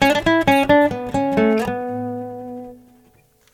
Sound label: Country; carte blanche for a fistful of teeth; windows gamerip 2006